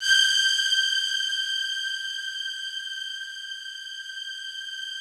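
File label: Rough Strings G5, Yamaha VP-1; 90 s Sample CDs; Music Store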